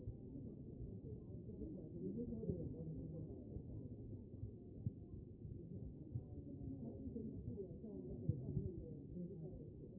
CHEST; B MODE

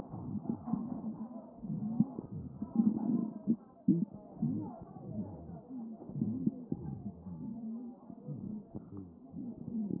CHEST pos1 D MODE

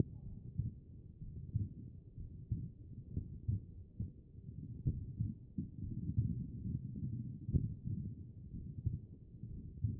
HEART; B MODE

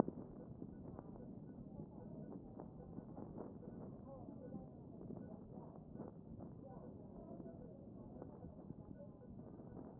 chest

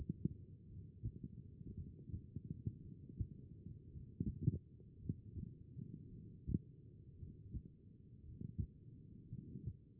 HEART B MODE

